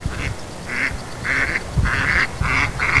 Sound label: mallard; west lake; Ariel Foundation Park